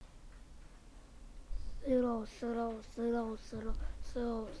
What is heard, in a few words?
throw